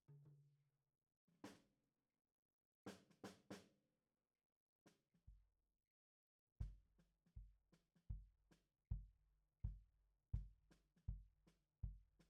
Kick out, Ragtime

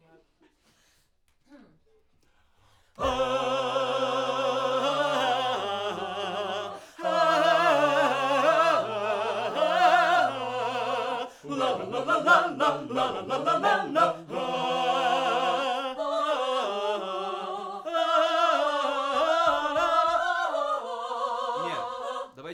Backs SCHOEPS

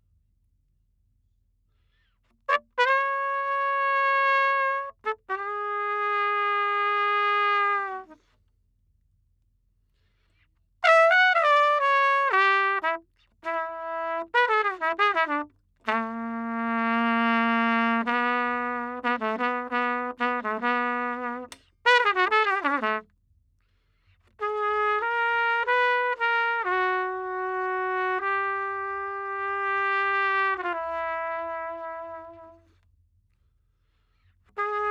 Trumpet

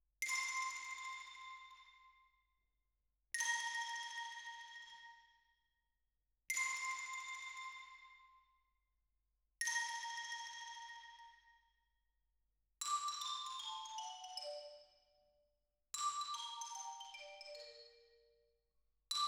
Xylo

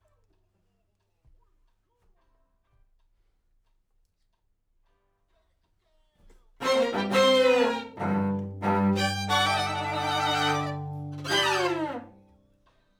Main Strings, RNR for piano

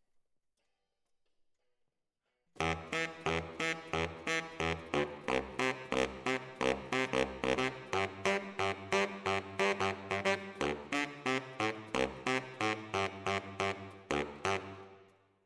ORTF SCHOEPS, piano

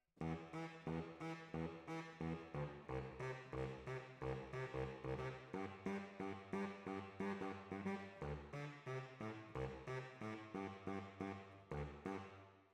Alto, RNR for piano

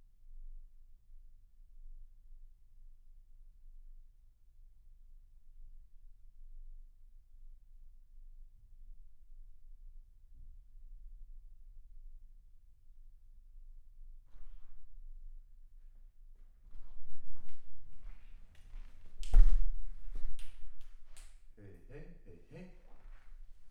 MAIN VOC

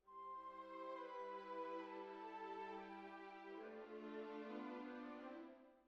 Cbasses

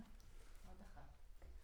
Center u47, Choir

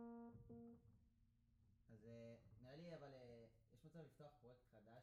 Piano B

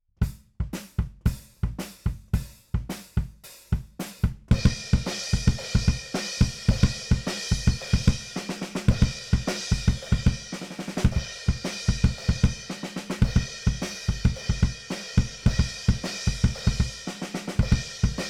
BD Out

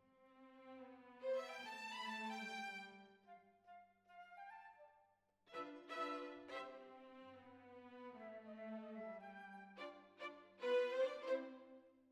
Violins Over

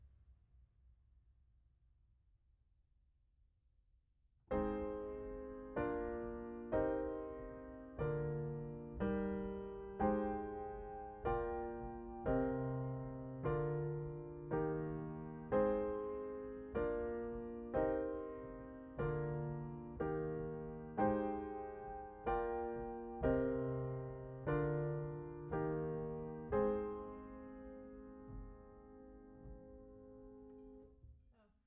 Piano Mono

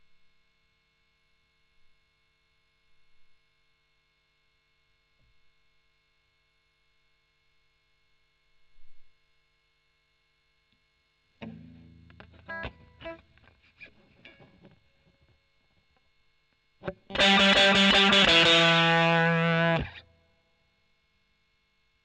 E. GTR Gefell OD

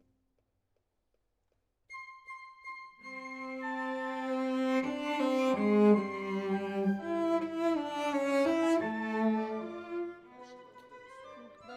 Cello Solo

